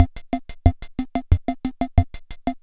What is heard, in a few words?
Yamaha PS-300 16 Beat